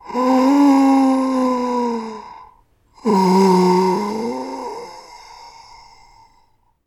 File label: old man breath; horror